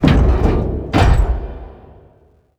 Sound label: turn